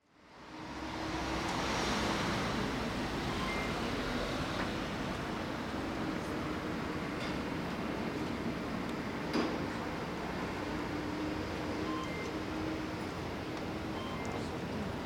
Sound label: Starting Tram; Massarelos